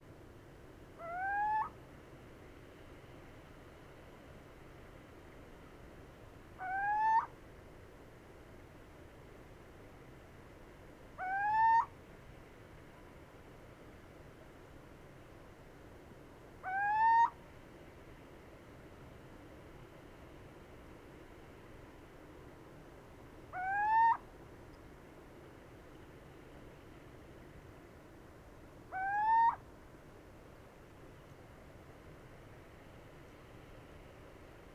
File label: Cucumiau Hooting of male Italy